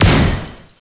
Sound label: hurt